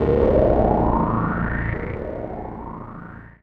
KEY SHOT TEKNO 140 A; Techno2; Singleshots

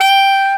A SAX POR G4, Alto Sax Portato; Orchestral; VarazdinOrchestral

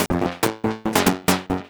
TECHNO SYNTH 140; Keys

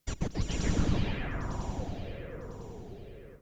FX Industrial 140

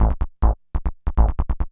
SNTH BASS ELCTR 140 A, Techno

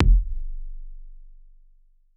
C1 Tuned Kick; Touch Loops; ElementsOne; Abstract Rhythms

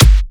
kick, drum hits; Anthemic

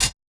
Hat; Touch Loops; BlissedOutBeats; One Shots; Drums

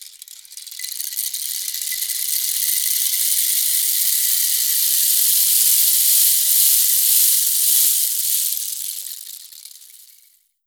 ETHNO EXTRA 90; ethno2

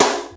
HIPITCHED SD, snare drum; SNAREDRUMS

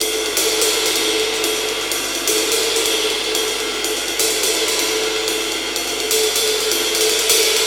125 Classic Breaks, 125

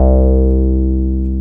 TB BASS; TB-303

